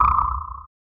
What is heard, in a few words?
quick sci-fi zap with hum